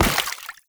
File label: Step Water, Foley, Footsteps; Warframe; Lasrian; Lasrian Soldiers; Lasrian Butcher